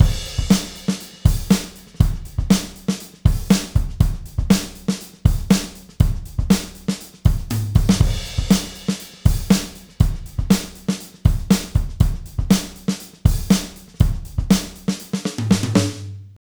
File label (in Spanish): Procesado tiempos lentos